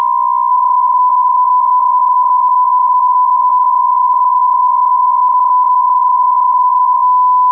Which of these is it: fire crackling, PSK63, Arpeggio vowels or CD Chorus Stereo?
PSK63